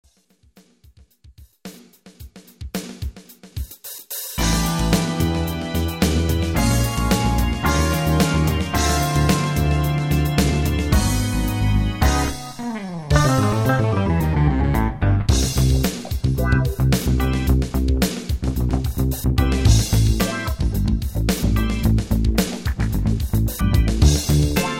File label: improvisation, guitars